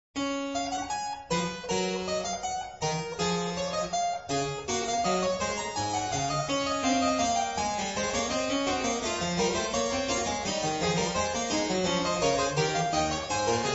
Sinfonia No. 3 in D Major BWV 789

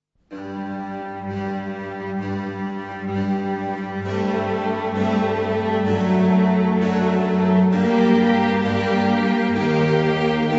Adagio e spiccato

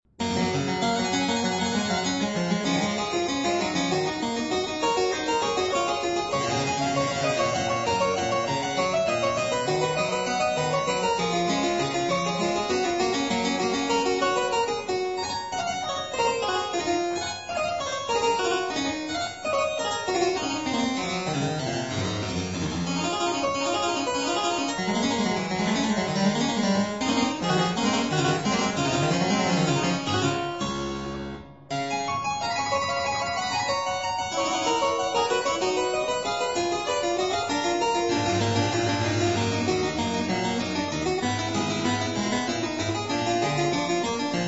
Variatio 26 a 2 Clav.

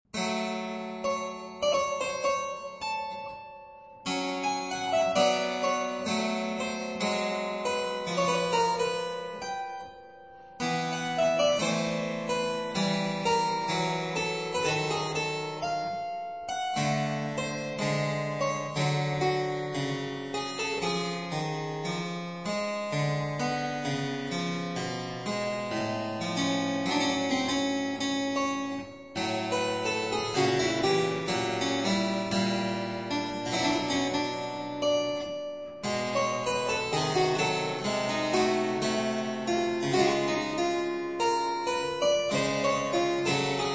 Variatio 24 Canone all Ottava a 1 Clav

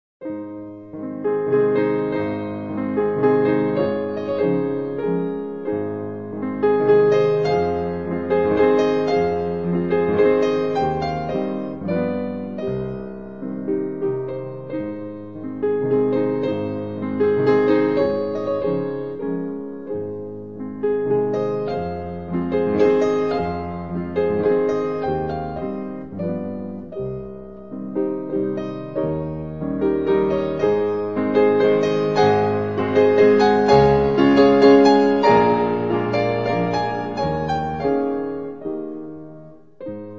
Waltz in A flat Major Op. 39

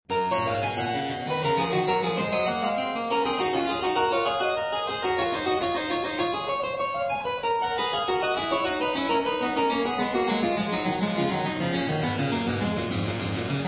Variatio 26 a 2 Clav.

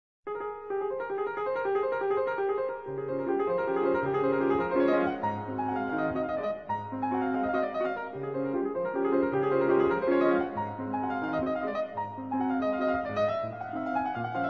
Waltz No. 6 in D flat Major Minute Waltz Op. 64

